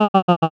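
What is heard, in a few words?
voice haha male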